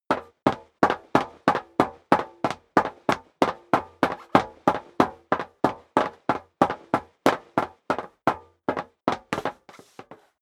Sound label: step sneaker metal dry run sequence; schritte